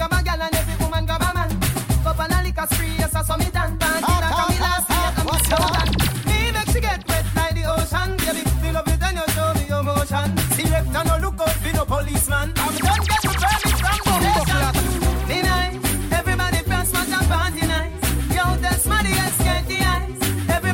dancehall mix

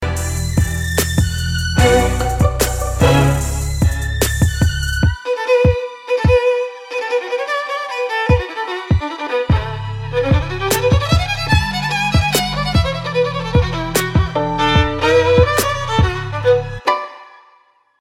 violin cover

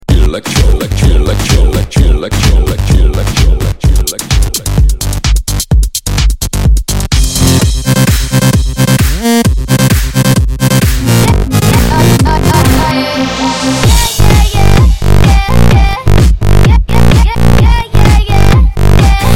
electro mania mix, ringtone

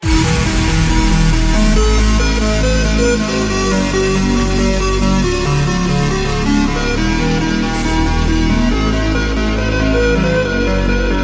trance energy

